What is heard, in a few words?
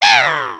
air guitar; emotes